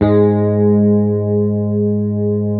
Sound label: Hamer 12, SUS, G#2, Bass; Sampleheads; Will Lee Bass Library